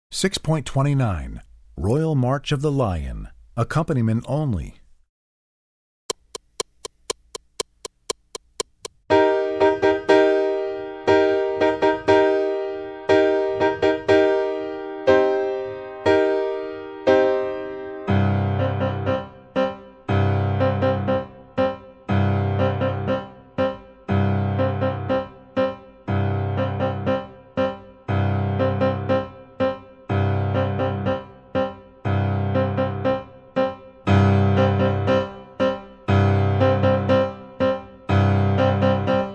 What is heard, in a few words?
Royal March of the Lion (Piano)